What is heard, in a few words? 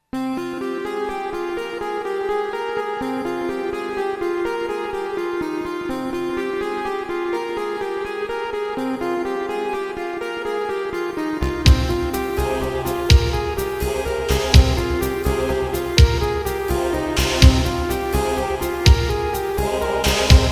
The Sea [Musical impression of a ship at sea, waves crash, ropes creak, seagulls cry]